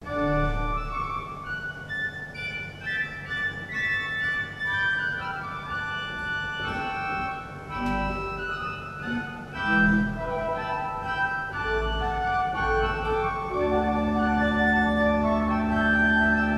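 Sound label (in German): Wordsworth 10 II P, pfeifenorgeln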